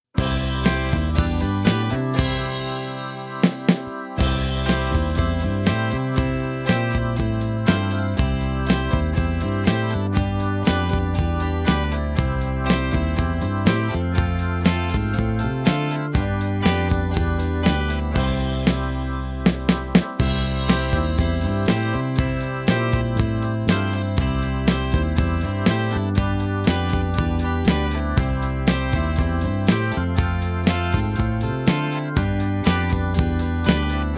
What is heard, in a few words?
Bye Bye Buddies Instrumental